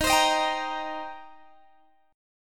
Eb 6th Eb down Guitar Open G

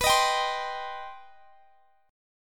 C Minor, B, chord; strummed; Cm/B

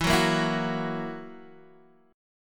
E Suspended 2nd Flat 5th E down Guitar Open D

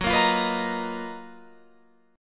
F sharp Major 13th Guitar Open F; F#M13 Chord; strummed

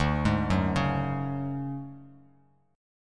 D Major 7th Flat 5th D down Bass Standard, chord; arpeggiated; JGuitar

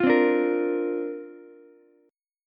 C 7th Suspended 2nd Suspended 4th, down, Ukulele; C7sus2sus4 strummed

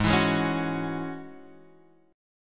Ab 7th Suspended 4th Sharp 5th, chord

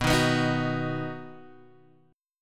B Major B, Guitar, Half Step Down

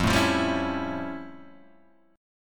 F Augmented Major 9th, Guitar, Half Step Down

